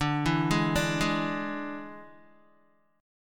D Suspended 2nd Flat 5th D Guitar; arpeggiated; chordshape

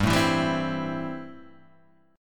A-Minor 7th G

